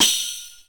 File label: SYRIAN TAM; Rarefaction; Poke in The Ear With A Sharp Stick